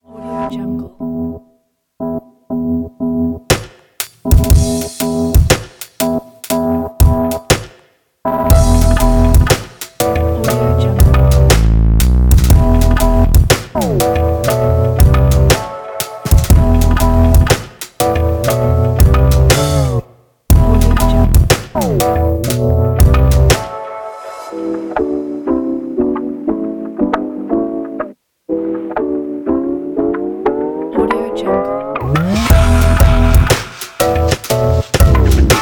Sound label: retro hiphop